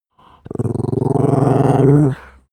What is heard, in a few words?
dog small growl regular; natur-tiere; hunde